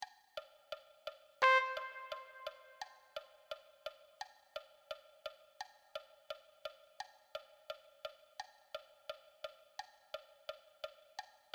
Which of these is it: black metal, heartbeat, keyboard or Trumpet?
Trumpet